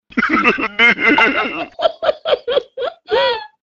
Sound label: jazzy laugh